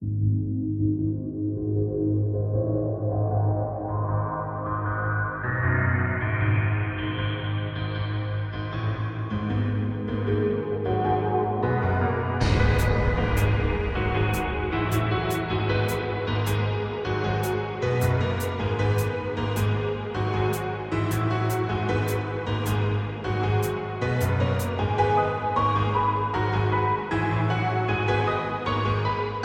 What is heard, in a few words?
Uptempo